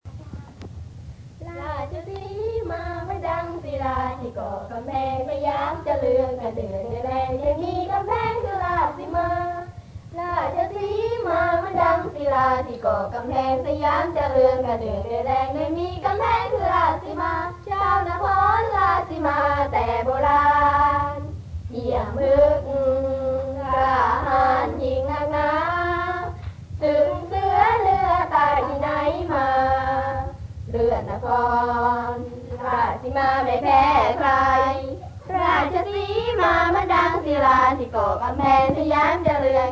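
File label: Students Singing; winterclub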